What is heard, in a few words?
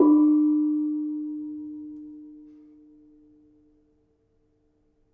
Ketuk, gamelan